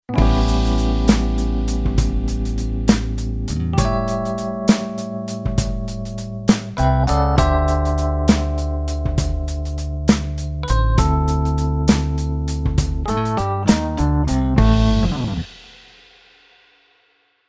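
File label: keyboard piano blues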